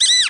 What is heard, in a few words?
squeeze